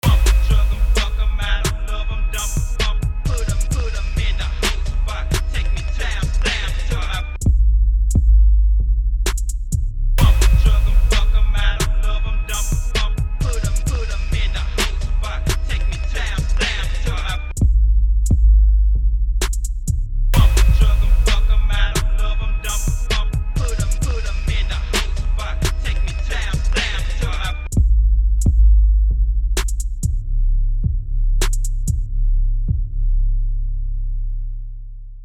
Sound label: funk beat